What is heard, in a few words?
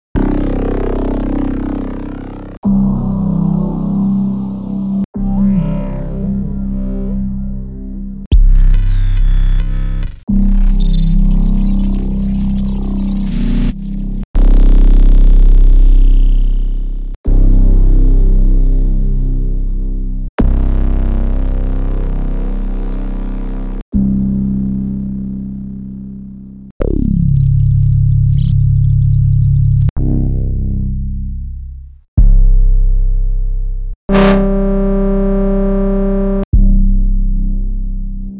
808 kit sounds; Sladerr 808 Kit Alchemy; 808鼓组采样; 25 个用于 SuperTrap 制作的定制 808 采样